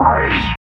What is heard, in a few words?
OWAH; East West - Phat & Phunky CD2; 90's Sample CDs